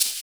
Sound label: Shaker; Equipped Music; Loungin House